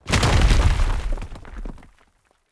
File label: stone gate deth, monster